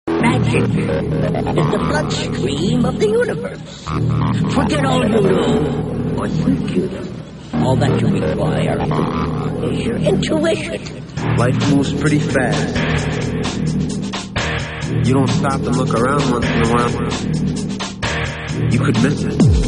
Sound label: electric